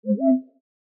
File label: success; vscode server linux x64 web; accessibilitySignal